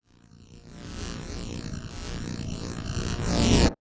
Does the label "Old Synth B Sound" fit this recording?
No